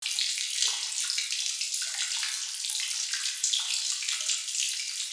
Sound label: Drips Sewer Cave